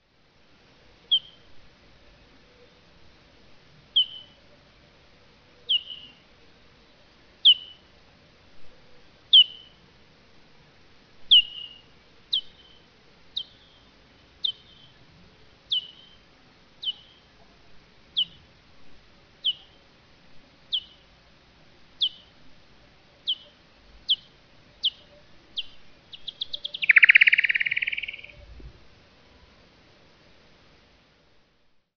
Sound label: BAT southern freetail buzz